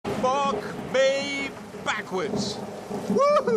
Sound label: hahaha